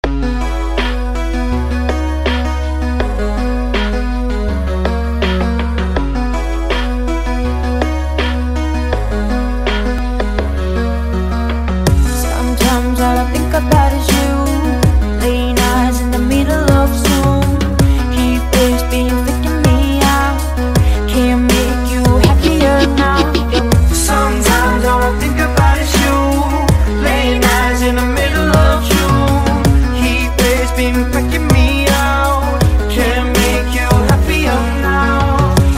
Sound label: remix edm cover